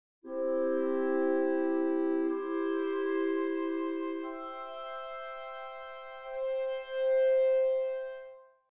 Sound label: chords; Nikolai Rimsky Korsakov